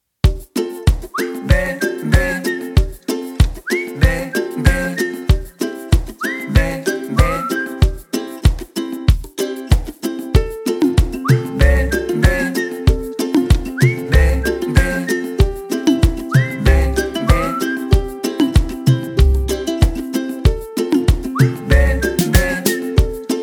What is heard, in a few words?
instrumental